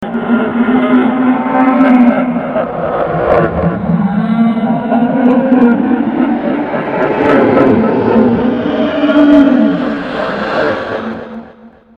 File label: ghost